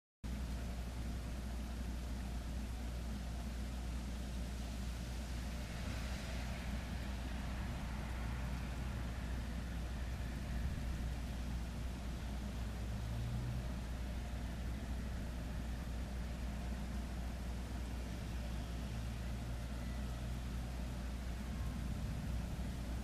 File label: siren